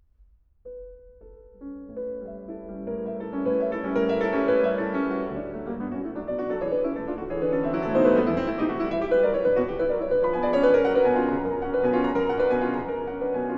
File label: D major: Allegro molto, piano